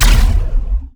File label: plasma fire, weapons; Stellaris